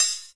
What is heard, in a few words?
house Open Hihat; House Kit; STYLE KITS (Hip Hop, Etc); Choosy House Kit